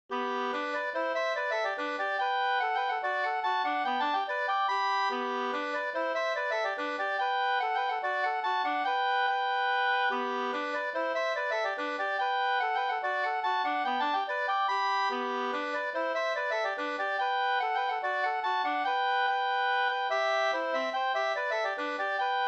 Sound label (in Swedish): mazurka, 2st